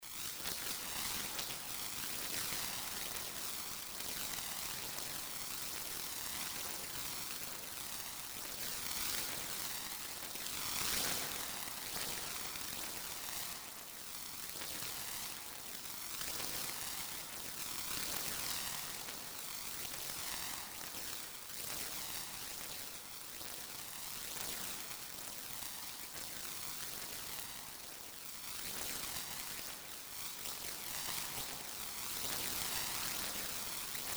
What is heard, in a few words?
electric gamelan radio scraping static